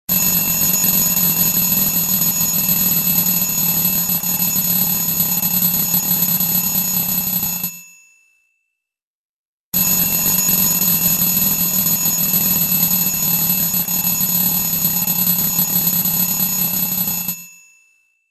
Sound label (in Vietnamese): Nhac chuong bao thuc co dien